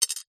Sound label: household pill tablet single drop on glass; zapsplat